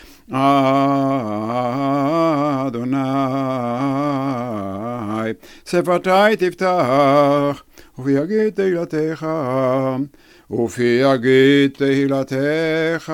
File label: Adonai sefatai tiftah